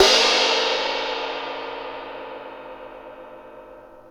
Big Crash One-Shot, cymbal; KJ Sawka; Drum n Bass & Beyond; Drum Tool Box

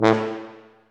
TUBA, Solo Brass, Tuba stacc; Roland LCDP12 Solo Brass; BRS Tuba

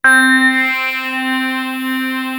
WAVEROL, KORG; East West - Global Sounds; WS KORG#2

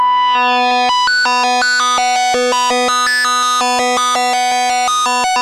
JUPITER 8 G4; Hollywood Edge - Giorgio Moroder Rare Synthesizer Collection

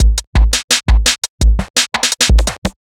Beat, Drum N Bass

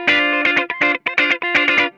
D PEDAL, Funk Guitar; 90 s Sample CDs; Zero G

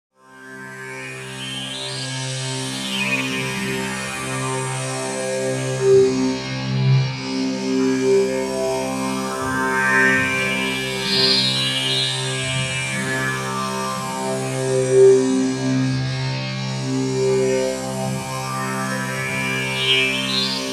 Panning Resonance, pad; Sonic Foundry (Sony Creative Software); James Johnson Spektral Minimalism; Pad FX

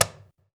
CAJON; Univers Sons - Raricussions

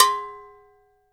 AGOGO HI, GM PERC KIT

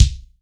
MG KICK, kick

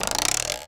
RULER; Rarefaction; Poke in The Ear With A Sharp Stick

